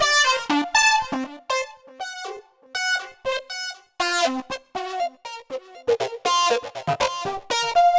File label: acid sequence; Sonic Foundry (Sony Creative Software); Ugly Remnants VOL-2